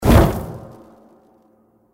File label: swap stampede out; Stampede Call of the Pride; Eyecon